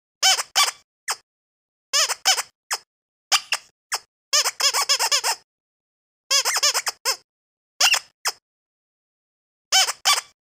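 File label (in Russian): игрушка пищалка собак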